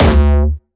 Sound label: D2, Jumpstyle Kick; Bassdrums; Jumpstyle Multis; vengeance essential club sounds-3; VEC3 Bassdrums